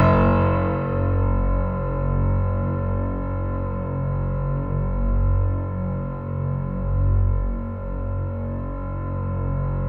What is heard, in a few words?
F#1, Synth Line; 90 s Sample CDs; NiceBeats; SynthLine VOL-22